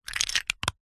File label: leisure bricks x4 in hand close squeeze crunch; lego